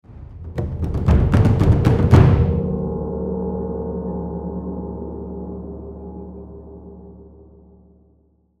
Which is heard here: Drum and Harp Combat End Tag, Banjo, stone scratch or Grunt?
Drum and Harp Combat End Tag